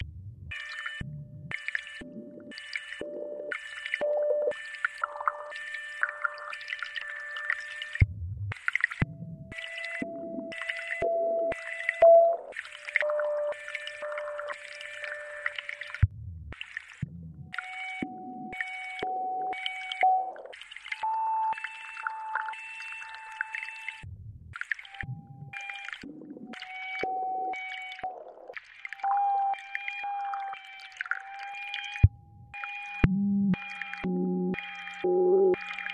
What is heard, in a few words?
frog